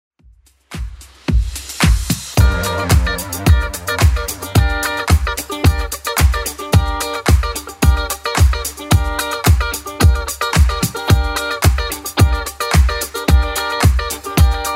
80s Club Edit 110bpm

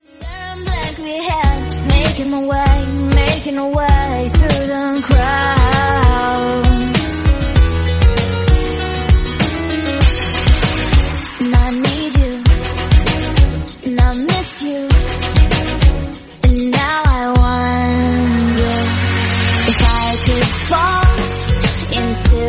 98 bpm F; REDRUM; remixplanet

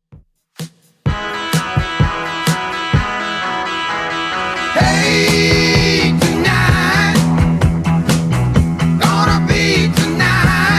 70s Redrum 128bpm

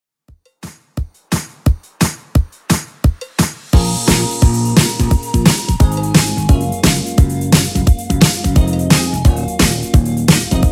80s Redrum 174bpm

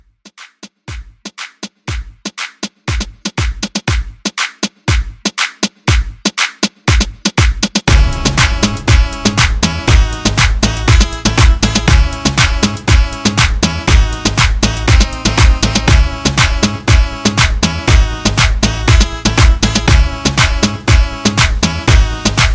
Mixshow Re Drum

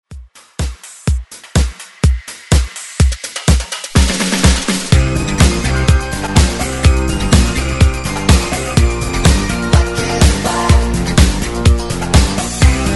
80s ReDrum 125Bpm; DJDOLLS

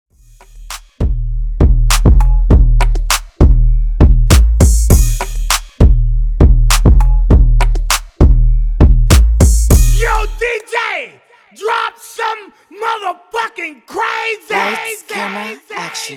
Hype Intro 100Bpm

